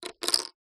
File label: leisure poker chips x3 set down on wooden table